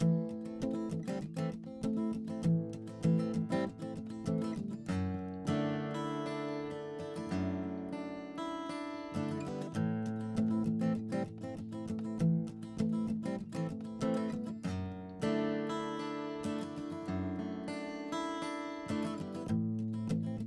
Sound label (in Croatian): SM57 tijelo; Reaper project